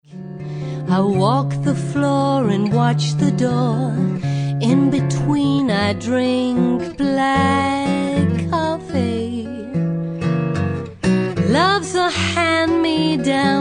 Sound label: female british english sing comercial; ideasonora